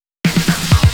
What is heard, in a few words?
Fill 128 BPM; Vengeance Samples; VEH1 Loops